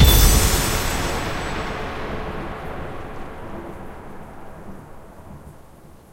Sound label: big Win Hit; parthenon